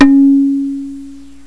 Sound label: gong